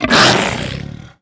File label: barnacle grab react other; Half Life Alyx